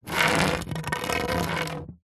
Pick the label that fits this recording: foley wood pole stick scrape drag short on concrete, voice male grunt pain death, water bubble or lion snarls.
foley wood pole stick scrape drag short on concrete